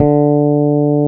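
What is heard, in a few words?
SMOOTH BASS D4; Drums In Your FACE!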